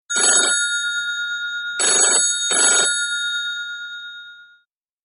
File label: Cute Ringer